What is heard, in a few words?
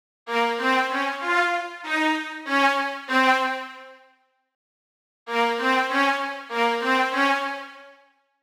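096 Hip Hop Lo Strings A# minor; Pro Tools Essential Loops; Digidesign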